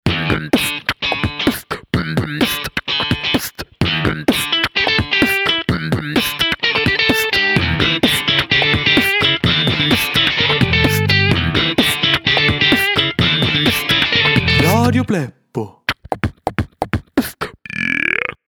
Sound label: Funk